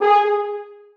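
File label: horns staccato g4; Sonatina Symphonic Orchestra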